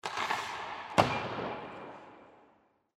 hockey puck pass

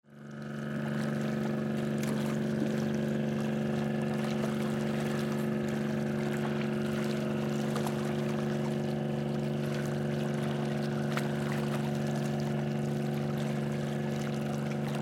boat

